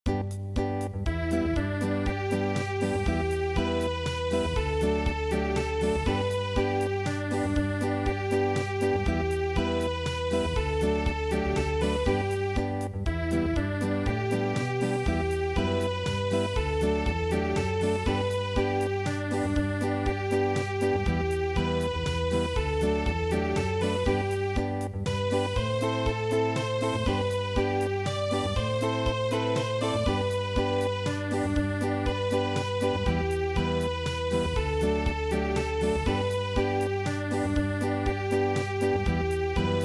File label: German Dance Song Acoustic